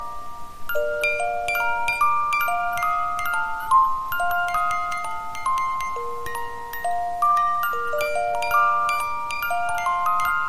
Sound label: Hush Little Baby, Music Box